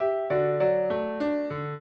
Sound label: trio, piano; Mozart